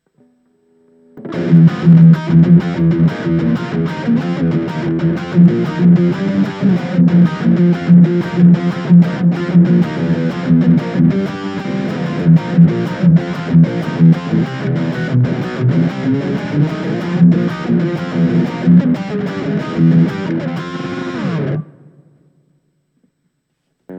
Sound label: Distortion